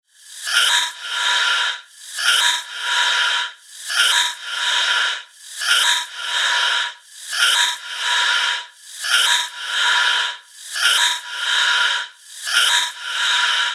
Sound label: FX Loop 140 BPM; Fresh; revealed fx loops vol 2